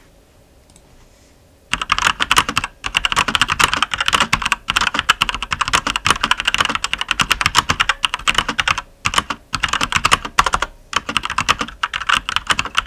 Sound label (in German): schreiben; SGK30